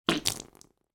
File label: human spit water out on carpet floor